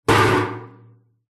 metal container bin empty set down on concrete mic inside pov; zapsplat